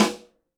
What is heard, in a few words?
Snare, Roland TD-20